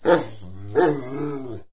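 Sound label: rural random dog big; random amb sfx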